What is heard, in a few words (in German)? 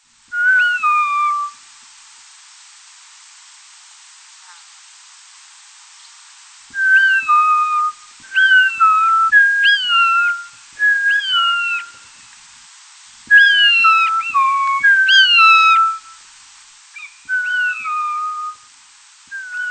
vogelstudien